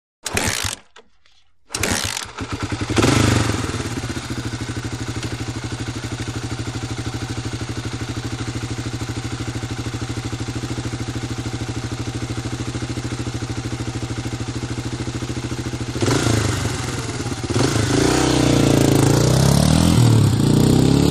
Motorcycle; Start / Idle / Away; Honda Trials Bike Start Up, Idles And Away To Long Shot.